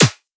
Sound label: clap snare; Anthemic